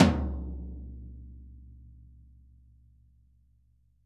VINT KITS; Sonic Reality - Vintage Timetraveler

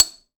Mug, household rhythm samples Hits

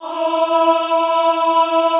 AHH E3, Extended Classical Choir; Best Service

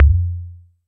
Tempest 808 Style Kick, Drum Machines; 300 Drum Machines; Dave Smith Instruments

